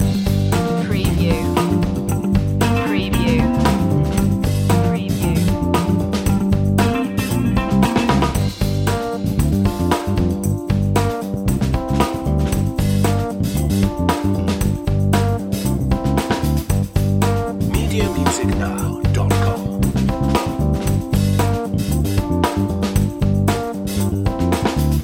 cocktail funk loop

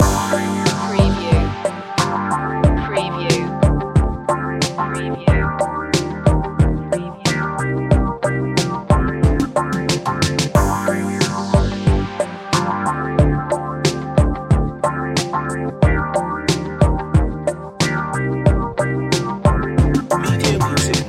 mellow retro loop

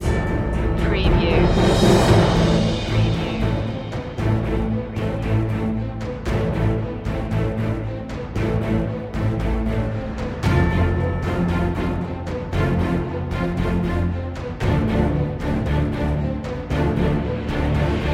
no choir low intensity

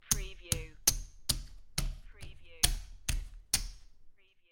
Poker Chips Stack Chips On Top Of Each Other

